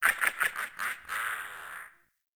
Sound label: Laugh; Anna Ki; Warframe; Caliber Chicks Minigame; Boss